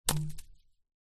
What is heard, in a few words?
tennis racket drop grass; sport; zapsplat